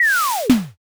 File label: something falls